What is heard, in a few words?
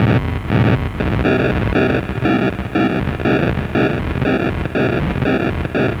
Haunted Transmission; Sonic Foundry (Sony Creative Software); Loop Noir